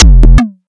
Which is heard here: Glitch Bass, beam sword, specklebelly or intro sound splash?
Glitch Bass